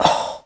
BREATY ORR; AMG

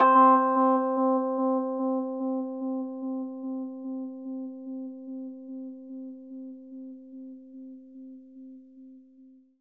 RHODES OCTAVE C; 3D Sound; 3D Keys VOL-1

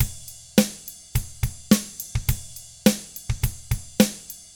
pop rock drums; 105POP ROCK

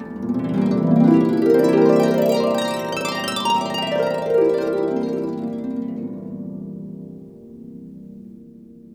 gliss sweeps, harp; InVision Interactive; Lightware VOL-1; Instruments & Percussions

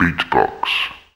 BEABOX VOX, VOCAL

